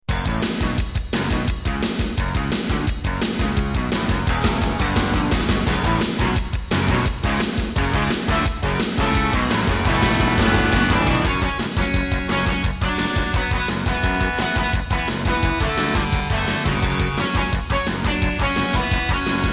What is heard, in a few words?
Roland SCC-1; Duke Nukem 3D